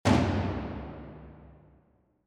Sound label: Toms